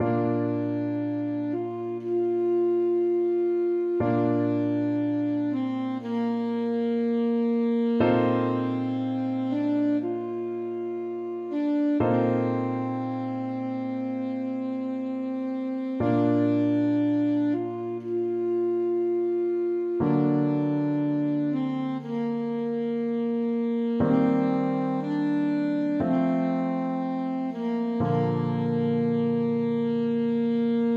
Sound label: largo, saxophone; dvorak